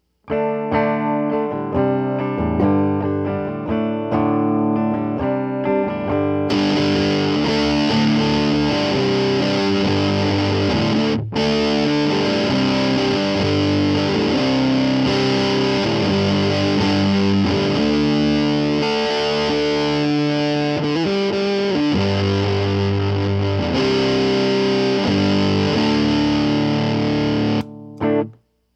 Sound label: Turn the Gain Down a Bit Again